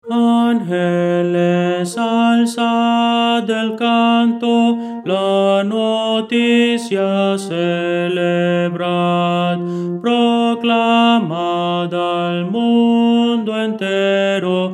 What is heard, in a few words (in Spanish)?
tenor, himno; angeles alzad el canto